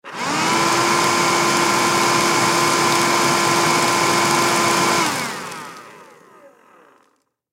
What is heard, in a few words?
Vacuum Cleaner, Vacuum Handheld Turn On Run Turn Off Short Suction; Household Vacuum Cleaner Oreck Handheld Piece Turn On Run Turn Off Short Suction Tube Cord Free; Appliance; Blastwave